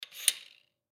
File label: Foosball Table; Foosball Table Change Score Spin Soft; Sports